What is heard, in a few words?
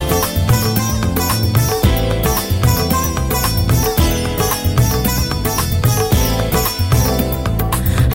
indonesian ringtone